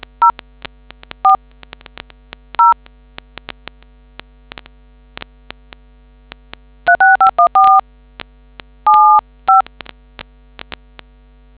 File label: dtmf